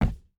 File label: footstep hide wood jump land; Rust